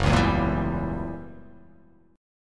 Fsharp 7th Flat 9th down, chord

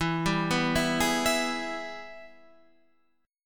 Gsharp Minor Major 7th Sharp 5th

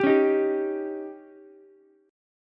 C sharp Suspended 2nd Flat 5th C sharp Ukulele; strummed; JGuitar

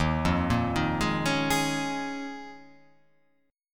D sharp 7th Suspended 2nd Suspended 4th

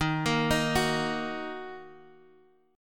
Eb Minor Eb Guitar Half Step Down

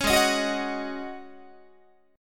Ab 7th Suspended 4th Ab down Guitar

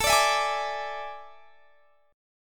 A Major 7th Suspended 4th; AM7sus4; strummed; x,24,24,21,21,22

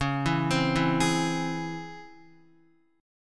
Db Minor Major 7th Db down Guitar; arpeggiated

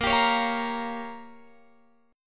Bb Minor 6th, Guitar, Open F; Bbm6 strummed

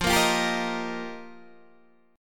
F 9th Suspended 4th F Guitar Open D, chord

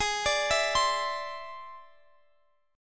E Augmented 7th Ab, guitar

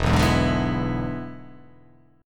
E 7th E down

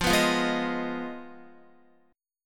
F Minor 7th Flat 5th, Guitar; Fm7b5; strummed; x,8,9,10,8,10

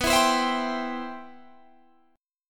G 6th Add 11th B, chord; G6add11/B strummed

